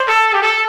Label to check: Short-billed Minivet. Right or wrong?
Wrong